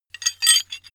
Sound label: metal handling pieces